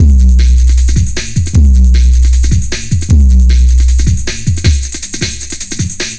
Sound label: 155, breaks, Loops